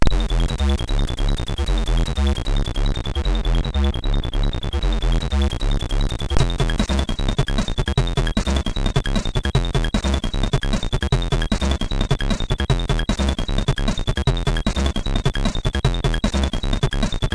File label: Crash, MOS6581R3; hvsc